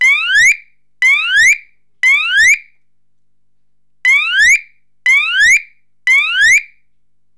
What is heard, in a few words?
Sounder